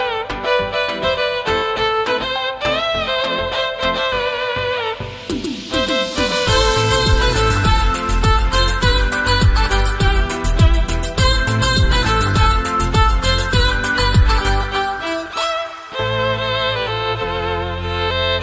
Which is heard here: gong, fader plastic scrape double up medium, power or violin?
violin